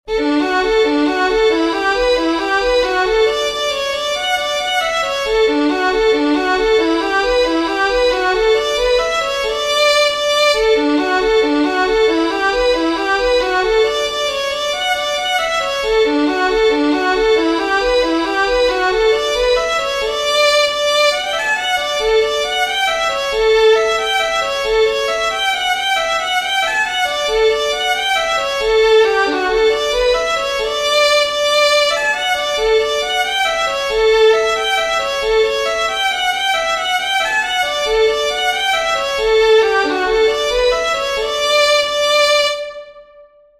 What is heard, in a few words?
Maurice OSheas Jig, fiddlers; southern kings fiddlers